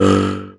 MT G, JHARP G B; Xsample VOL-9

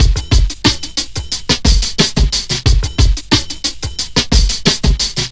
jet stream, 90bpm

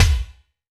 BD, BASS DRUMS; EdgeSounds

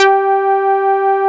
OB TITE G5; Drums In Your FACE!

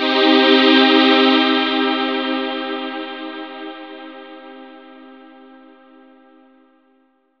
Distorted Stab, Strings & Pads; Zero-G Sound Sense Grime Scene; 90 s Sample CDs

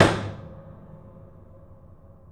KEY LID -L, The Piano; InVision Interactive

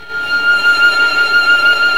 STR Violin, Solo Violin FX

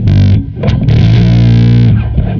Bass, Distorted; Bass X

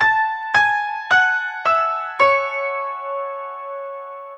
Piano (octaves); Sonic Foundry (Sony Creative Software); Ugly Remnants VOL-2

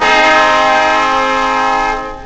trumpets; Amiga Soundtracker Sample Packs

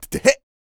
MALE HUMAN, Vocal Planet R&B & Dance; ILIO

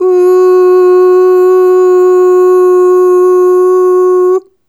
Ooh Long F#3 Dry, Harmonic Building Blocks

